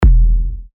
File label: Kick E; techno; Rogan